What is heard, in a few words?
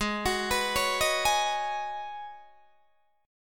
G sharp Minor 11th G sharp Guitar DADGAD, chord